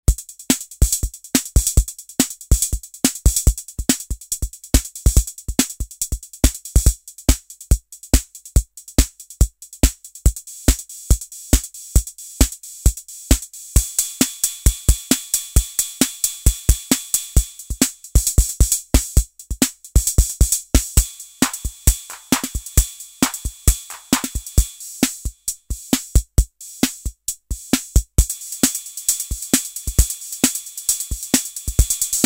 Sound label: Boss DR-110 Preset Patterns